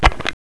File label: bone drop